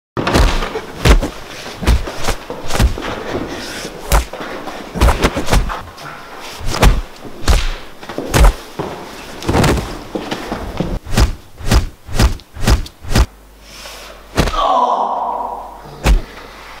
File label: fight